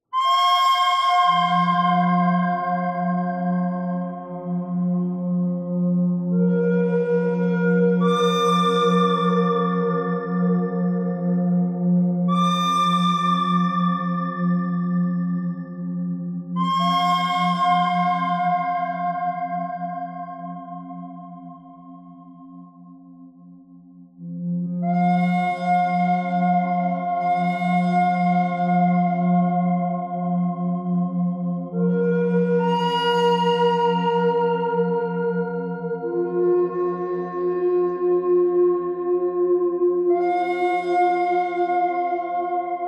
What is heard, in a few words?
bird thread pentatonic